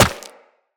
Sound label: Run Rock, Footsteps; Operator